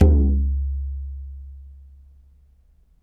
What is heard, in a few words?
African Perc